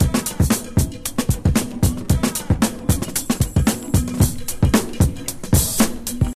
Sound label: breakz